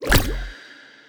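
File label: fish heal, impact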